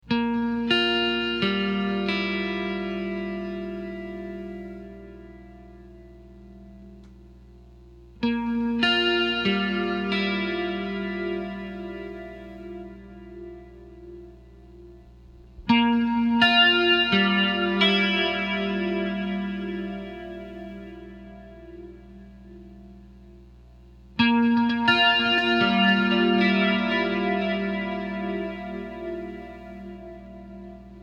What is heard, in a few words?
Syds Theme Breakdown, Clean, Delay, Tube Driver; RT-20; CE-2